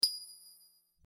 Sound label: coin flip